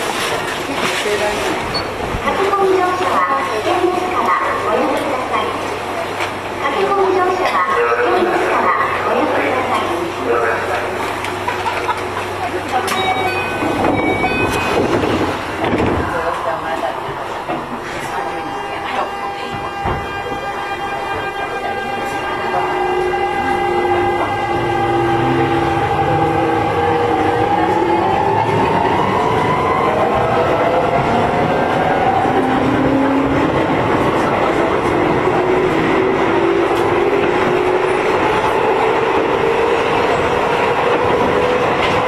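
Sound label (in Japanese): 8000系 聖蹟桜ヶ丘-分倍河原, 走行音; Keio; 京王線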